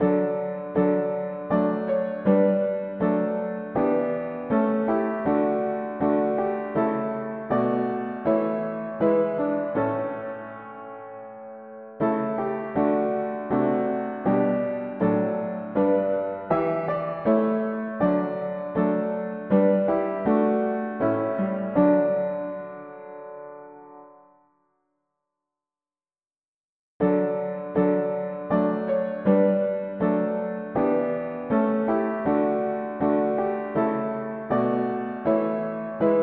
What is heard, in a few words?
mono-piano, hymns